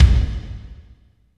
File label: KICK, Tekno Industrial; East West